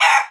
chicken panic; ambient; creatures; Counter-Strike Global Offensive

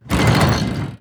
mid alert; Hearts of Iron IV Death or Dishonor

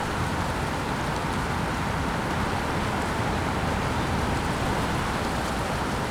desert med wind; ambient; Counter-Strike Global Offensive

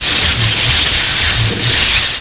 worm sign elec; Emperor - Battle for Dune

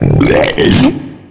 male boomer warning, voice; Left 4 Dead 2

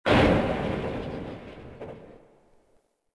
Goodyhut Metal; Ambient; Sid Meier's Civilization 4 Complete; Beyond the Sword

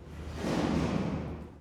dust storefront; Counter-Strike Global Offensive

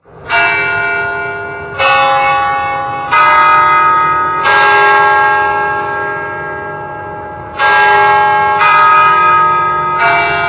church bell begin loop; Left 4 Dead 2

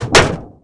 Metal object hit; AssaultCube; Alien Stars; American Muscle Series

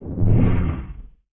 Grave Strike; Sid Meier's Civilization 4 Complete; Beyond the Sword; Units

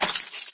flesh, footsteps survivor walk; Left 4 Dead 2

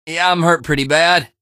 wounded; npc; Garry's Mod; hl2; Barney

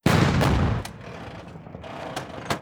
artillery unit fire; Hearts of Iron IV Death or Dishonor

